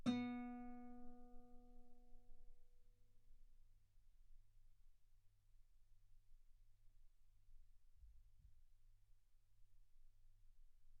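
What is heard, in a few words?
Guitar ff B string B3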